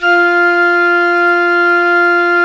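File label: Tone F4, church organ; Best Service; Advanced Media Trax Modern Composer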